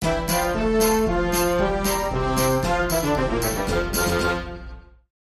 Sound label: Gladiator March